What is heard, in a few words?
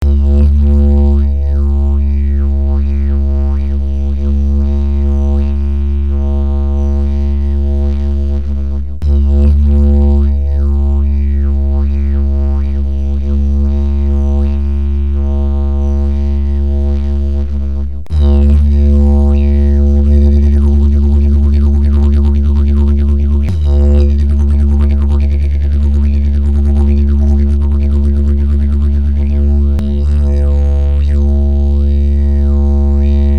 didjeridoo